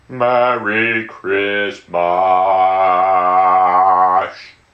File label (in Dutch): hohoho slechte zanger